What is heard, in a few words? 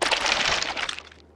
mined, mining; funneltrunk; Factorio